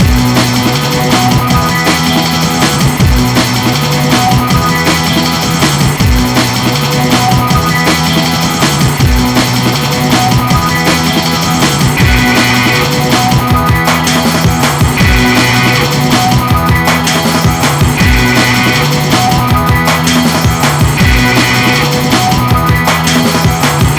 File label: Hardcore Breakbeat, 160 Bmin; Big Fish Audio - Hardcore Breakbeat Action